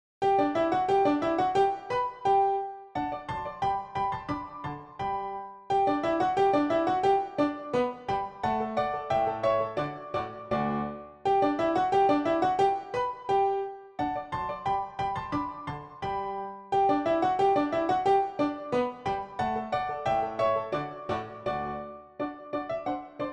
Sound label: Dance PianoTeq Bechstein